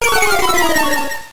Stat Lower, Battle Effects; P3D-Legacy

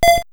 land, pokeball; P3D-Legacy

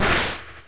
normal damage; Battle Damage

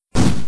ember, Battle Effects; P3D-Legacy

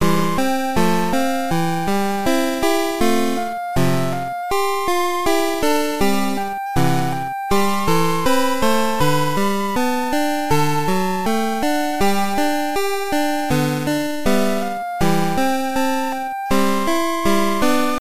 pokeflute; P3D-Legacy

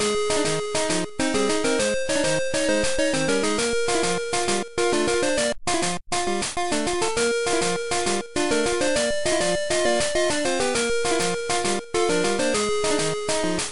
march; pokemon; P3D-Legacy; 2.5DHero

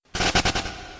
running, Battle; P3D-Legacy

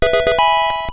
success small; P3D-Legacy

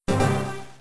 rankplace, Contest; P3D-Legacy

